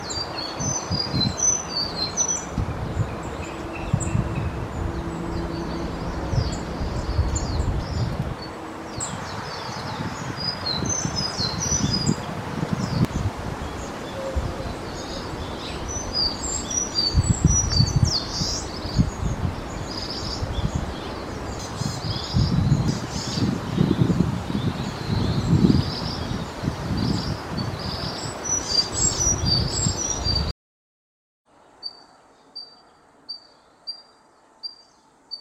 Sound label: ezomushikui sanshokui